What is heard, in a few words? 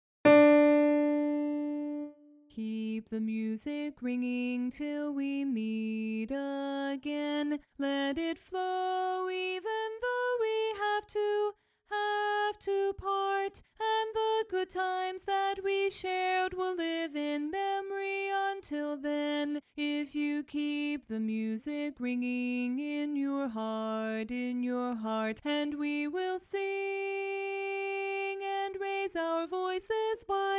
Bari Alone